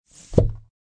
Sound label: shoop